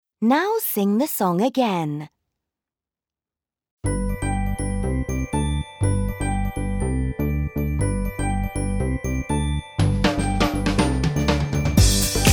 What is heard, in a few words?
Karaoke; Super Minds Level2